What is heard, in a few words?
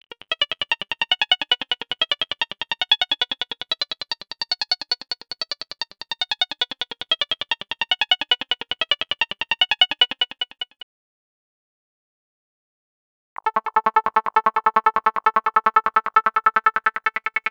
Syn Loops FX; X-Static Goldmine 5